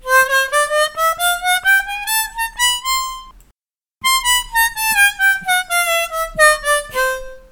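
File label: Chromatic ORCHESTRAS, harmonica; Seydel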